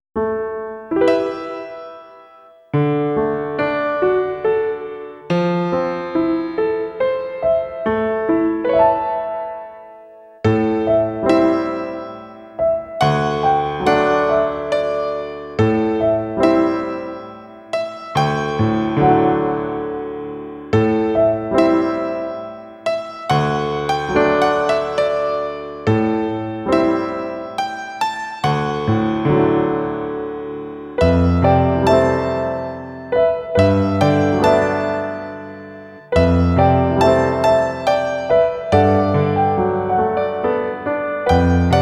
Der Mond Das Meer Piano Version

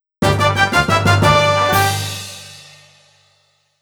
Jingle - Quest Clear; Tales of the World Radiant Mythology

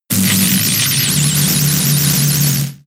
Eerie Impulse; pokemon sfx gen 6 attack moves xy oras

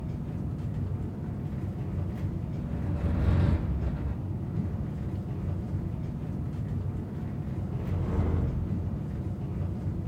Elevator Loop; Devour

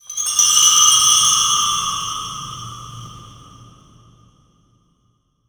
environment temple glitter

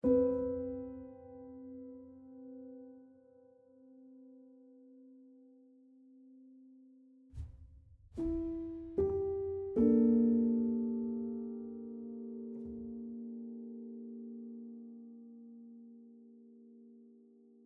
74bpm; theme feelinglost bugemissaryconvo start

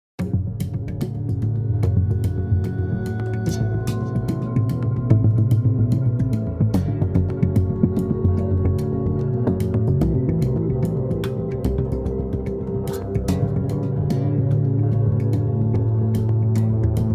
Action loop

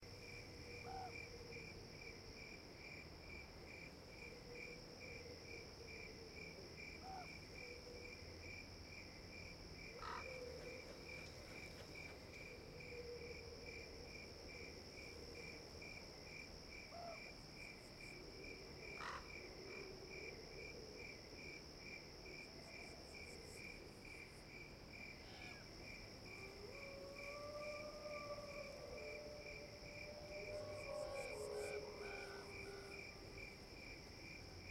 crickets

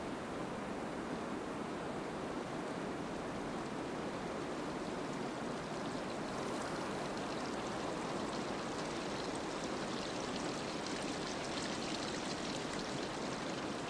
Year of the Dragon Ambience

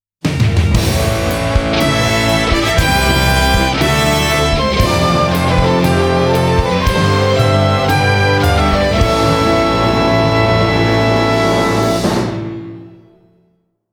Opening Riff; Regalia Of Men And Monarchs

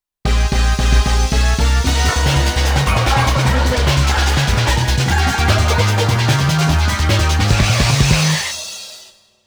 Goal; Dangerous Curves

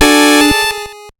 Music Hesitation; gamerip; shovel knight king of cards

